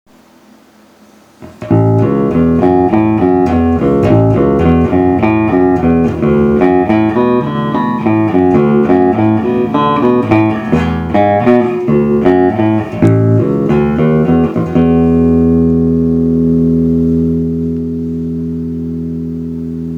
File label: Blues, guitar